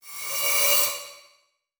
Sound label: Transition Whoosh Medium